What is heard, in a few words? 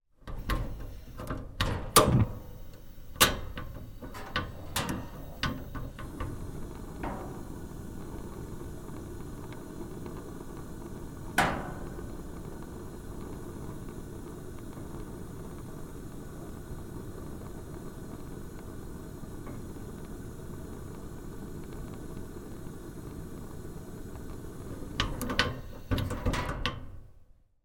household gas fire turn on run off